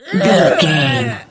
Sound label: voice female death